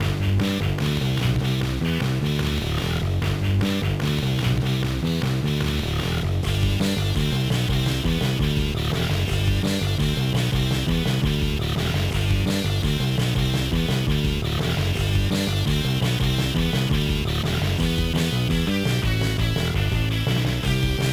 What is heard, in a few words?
BGM; Shrek Reekin' Havoc; GBA